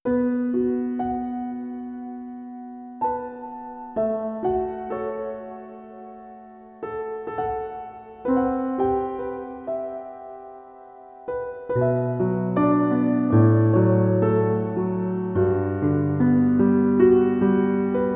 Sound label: Time is a Place (Piano); Finding Paradise Soundtrack